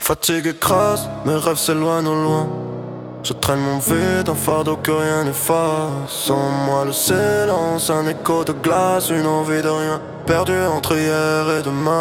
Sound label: Sad Rap; rimes embrassees